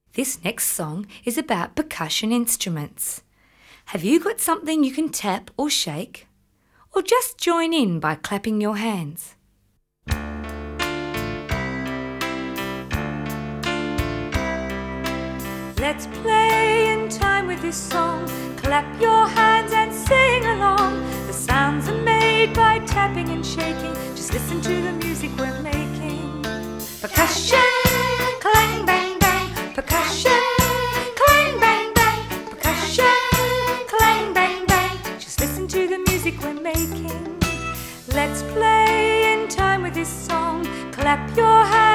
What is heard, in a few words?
Percussion